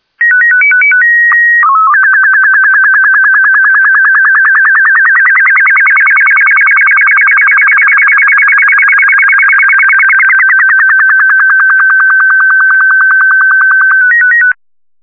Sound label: sstv; Kerbal Space Program; Chatterer